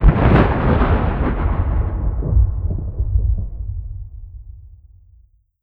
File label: city battle; War Ambience